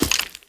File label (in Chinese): 脚步声; 沼骸